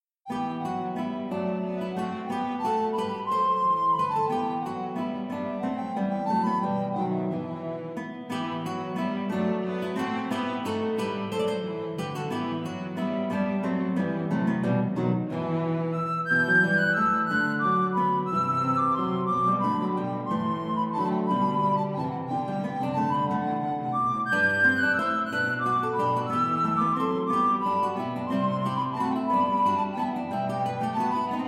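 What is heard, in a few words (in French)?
trio pour flûte à bec guitare violoncelle; chauvet alexis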